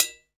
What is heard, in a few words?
hi-hat tip muted; Roland L-CDX-01